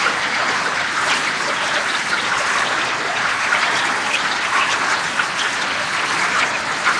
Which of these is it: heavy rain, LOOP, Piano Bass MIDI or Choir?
heavy rain